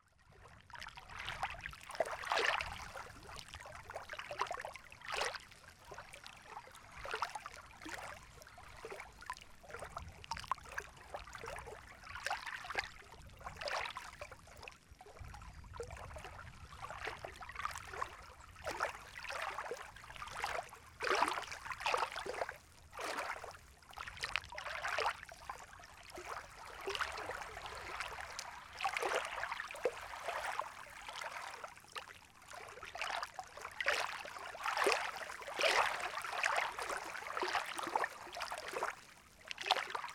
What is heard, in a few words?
Lake Waves; Wisconsin 2019